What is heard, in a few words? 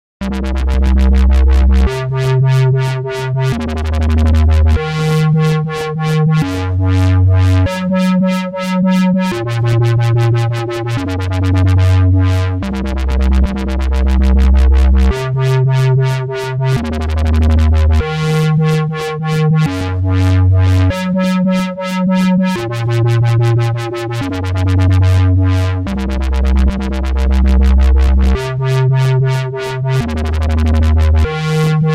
dubstep